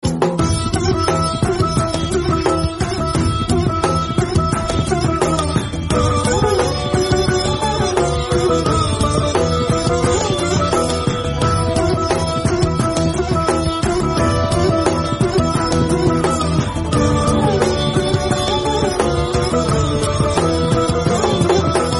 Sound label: instrumental background music